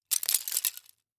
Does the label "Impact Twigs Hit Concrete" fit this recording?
Yes